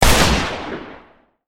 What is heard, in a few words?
Smith AND Wesson 4506; weapon